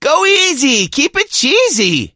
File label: hurt